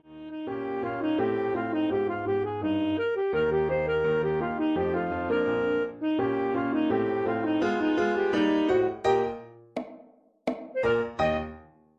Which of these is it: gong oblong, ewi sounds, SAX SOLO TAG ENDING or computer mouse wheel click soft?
SAX SOLO TAG ENDING